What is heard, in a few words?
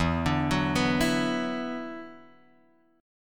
E 7th Suspended 2nd, down, Guitar, Drop D